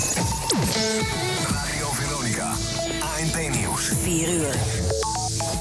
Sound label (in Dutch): Radio Veronica ANP nieuws jingle